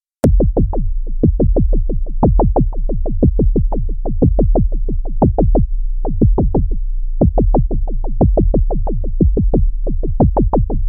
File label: Axxe Filter Resonance